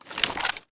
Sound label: aug firemode, weapons; STALKER; IWP